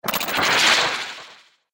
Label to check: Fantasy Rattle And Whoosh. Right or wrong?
Right